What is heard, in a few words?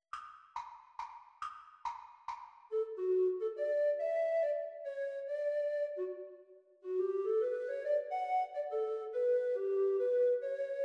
Treble Recorder Performance speed; Grade 2